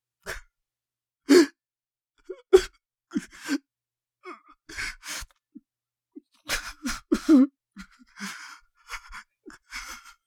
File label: Man sniffling and crying